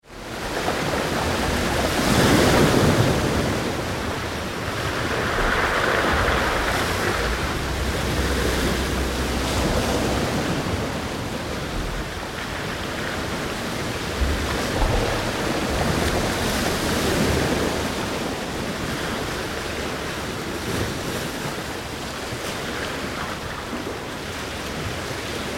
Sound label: Ocean Sea Medium Surf onto Pebble Beach; Close rolling pebbles and waves; Ocean Sound Effects